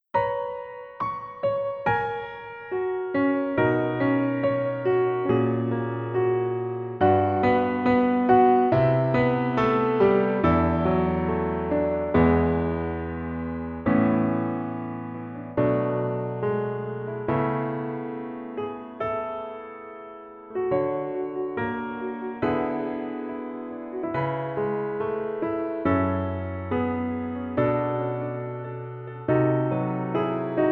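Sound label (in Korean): Piano version 멜로디MR -1키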